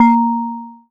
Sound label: BELL-GONG, A#; Big Fish Audio